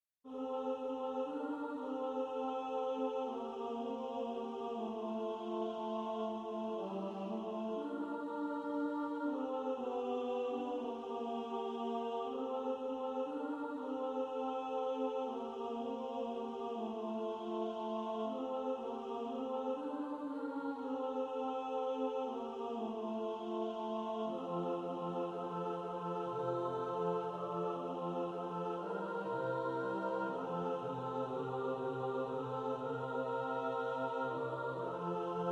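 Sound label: alto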